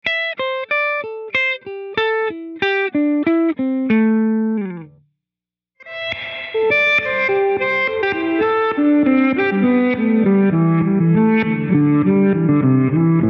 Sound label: Reverse Delay Full Wet